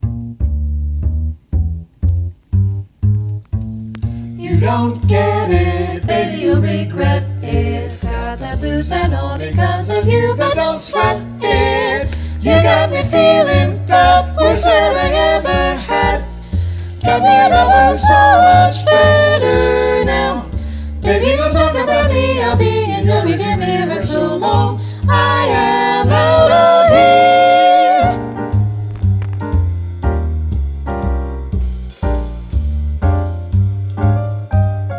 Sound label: Bb Vocal Jazz Etude no alto; jazz all state